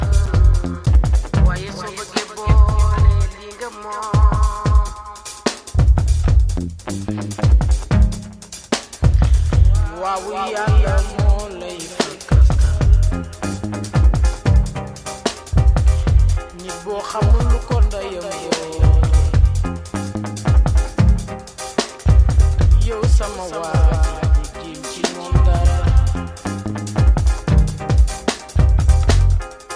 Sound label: Kick & Bass mix